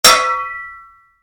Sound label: Spade hit, Shovel, Impact; Yard Tools; Stuart Duffield